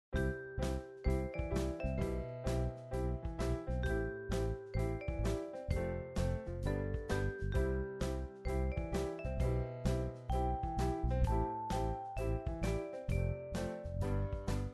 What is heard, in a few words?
jingle